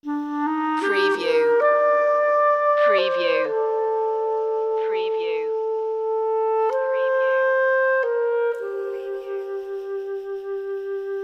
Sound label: lone clarinet